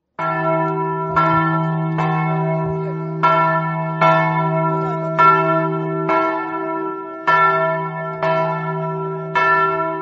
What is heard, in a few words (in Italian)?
scampanottata, campane